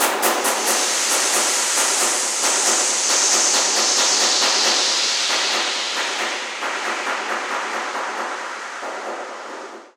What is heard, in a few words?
Cave Tsheetgroove